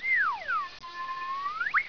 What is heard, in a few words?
slide; 12000 Graphics Pack - A Complete Graphics Collection For Your PC